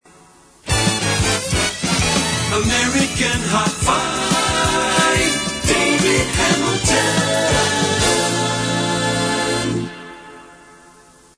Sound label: jingle